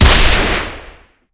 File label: spy revolver, weapons; enforcer; tf